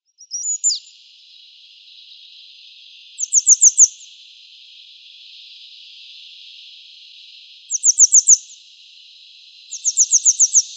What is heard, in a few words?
Grey Wagtail song